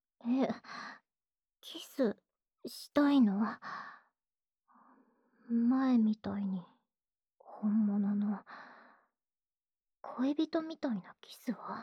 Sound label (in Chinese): 正常地接吻循環; 卿卿我我用